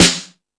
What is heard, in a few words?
Dance, Drum Machines